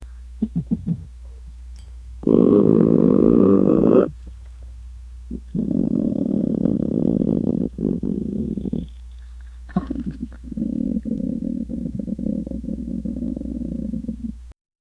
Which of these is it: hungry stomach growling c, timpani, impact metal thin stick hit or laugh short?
hungry stomach growling c